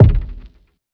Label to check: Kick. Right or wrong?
Right